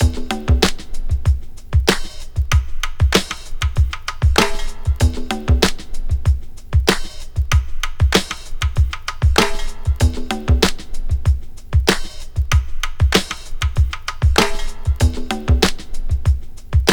96 Claperk, Breaks; Lockdown Breaks

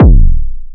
Borg Kick, drum kit; Polyend; Stazma & Concrete Collage; Concrete-Dual Borg

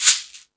Latin Layers, drum machine; E-MU Pro-Cussion-1

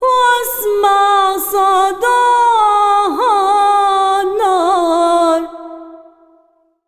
SINGER A, Arabian Traditions

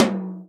tom, Real Drum Kit, jungle kit

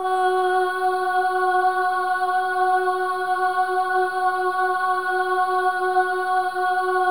SOLO BOY, AH; Spectrasonics; Symphony of Voices

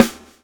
SWNG

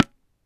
"Te", tabla; Mango Loops

